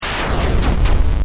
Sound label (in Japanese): zusyun zusyun